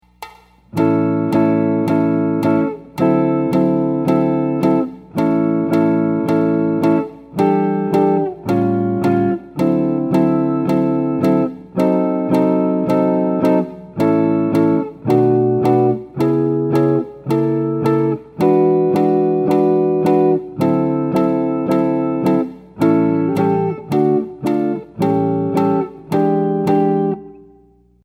Common Major Blues Variation, guitar

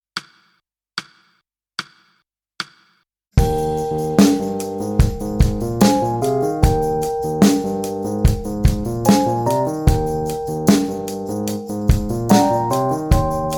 Soul City Blues

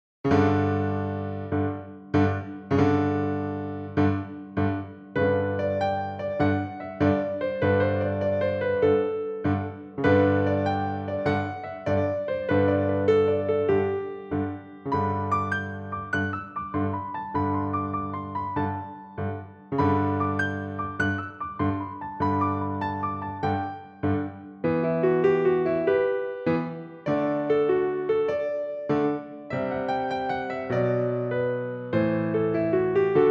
Scottish Jig, piano

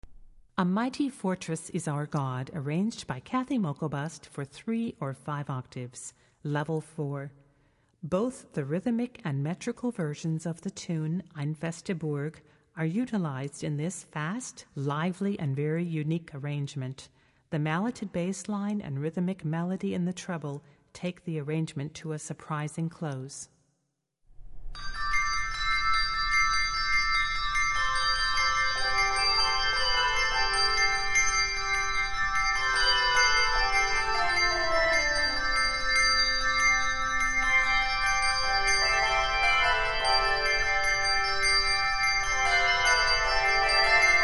Handbell Music, Handbells 3-5 Octave; Mighty Fortress Is Our God; Jeffers Handbell Supply

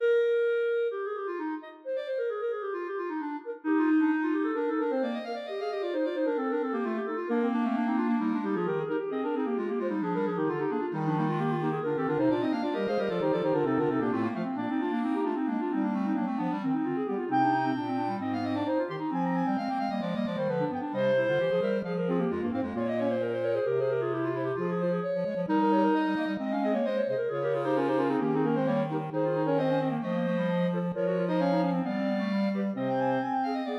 Allegro from Quartet in F; mozart; kendor music

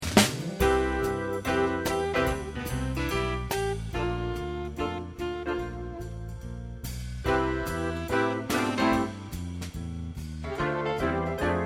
Broadway Piano

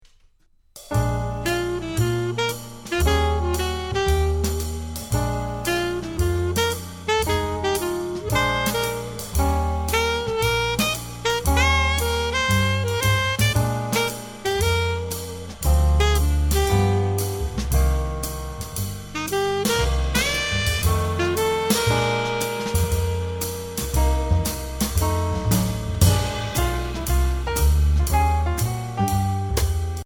Bebop Blues; Alfred Publishing

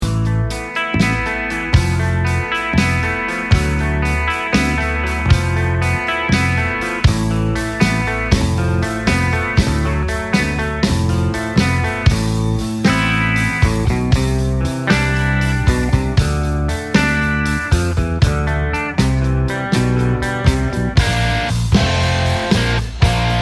Chord Prog Etude, guitar

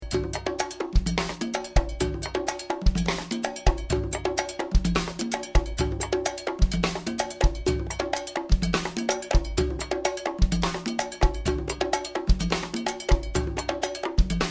Conga; Warner Bros Publications

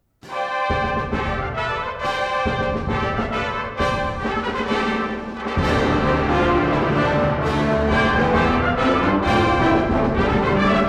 jubilant fanfare; C Alan Publications

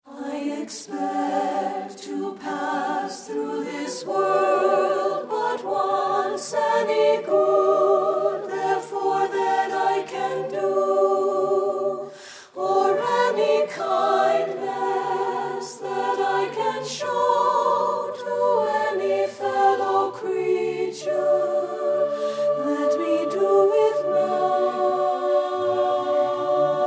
Alto, mixed choral

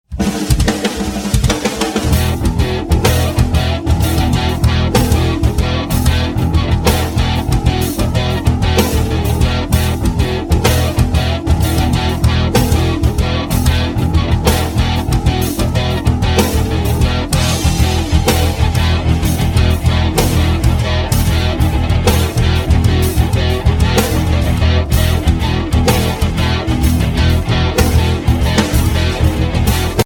Alien Hip Hop Slow, Drumset

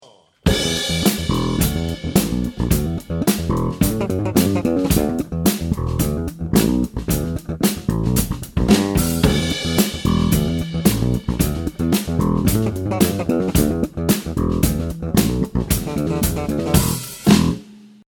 Double Plucking, bass guitar

